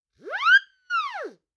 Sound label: acme whistle; prosoundfx